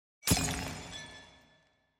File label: crashing glass